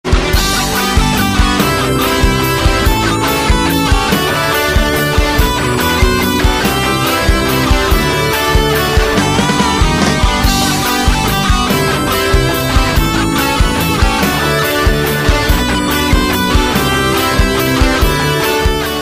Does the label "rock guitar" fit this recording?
Yes